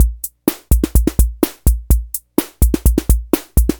126 ROCK

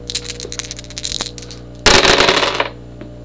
dice

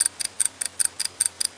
TIMER TICK; SpiderMan

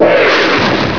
Zapper; The Ultimate Warrior 2.01

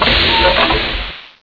door open; DevilDarts 2.0 FAT

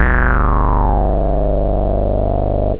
space, synth

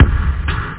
back drum

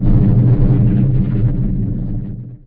Quake; 7 Kingdom

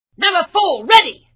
sound ready; operation graduates

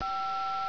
DIAL; 10,000 Graphics Pack Volume 1; Media Graphics International

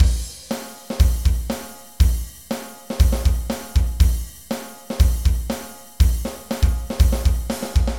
drum; Metal Zone

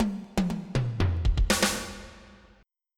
fill

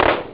claps; OctaMED SoundStudio V1

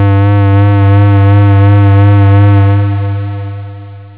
floote, synth

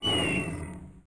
griffons; War3demo

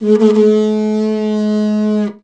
tusk toot; Musical Instruments; africa

